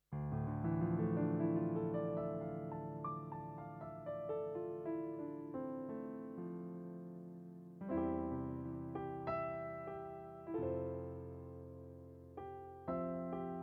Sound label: DGX650 Natural Grand Piano